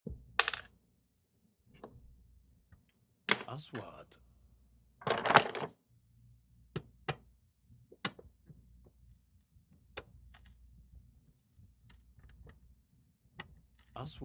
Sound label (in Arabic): شد سكروب; sound effect